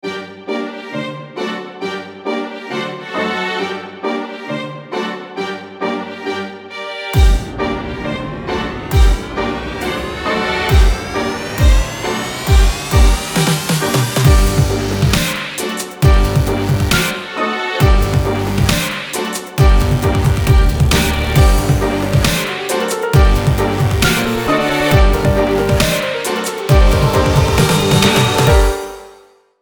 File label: STRINGS